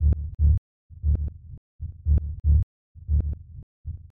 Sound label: techno bass 117 bpm; instruments; loops